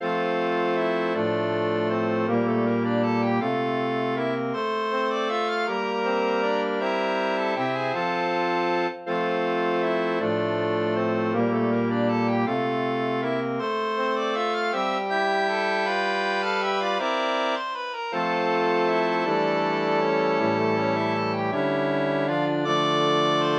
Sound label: Melodie gracieuse for harmonium; synth